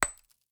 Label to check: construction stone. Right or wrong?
Right